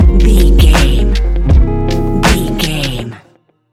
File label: futuristic melodic circuit stinger, A relaxed and chilling piece of music